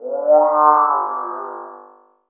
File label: VOX, Drum & Bass; Ueberschall; Drum & Bass Resonance; 150 BPM